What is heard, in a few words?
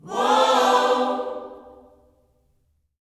WHOA OHS, Gospel; Spectrasonics; Vocal Planet Vol.1 Gospel